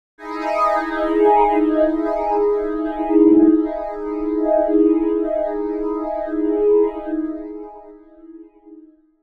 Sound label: Pad